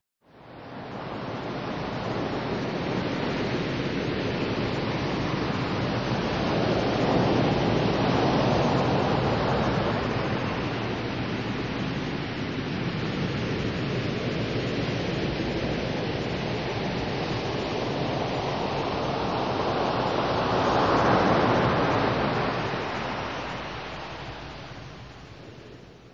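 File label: ocean surf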